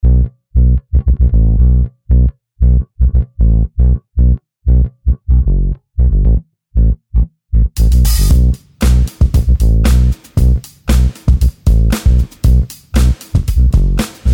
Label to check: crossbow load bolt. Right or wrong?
Wrong